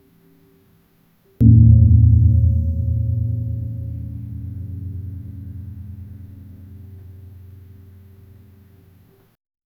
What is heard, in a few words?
generate a calm dignified, chimes